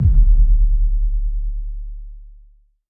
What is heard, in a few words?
lightning hit sub hit, weapons; kaiju; Stellaris; biogenesis